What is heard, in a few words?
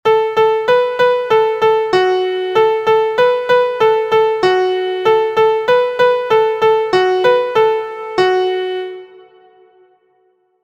MIDI